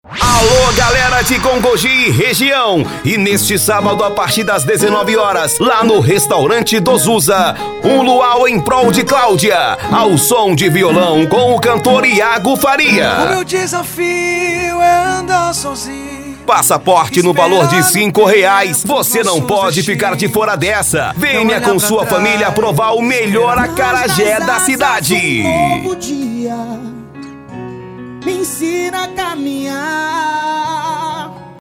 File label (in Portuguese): vinheta luau